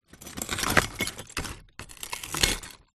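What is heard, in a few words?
broken glass bottle pieces crush grind; zapsplat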